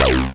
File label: Synths C; vengeance essential club sounds-3